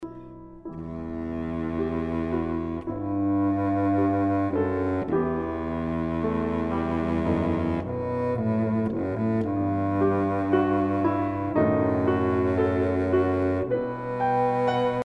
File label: Adagio, Big Bassoon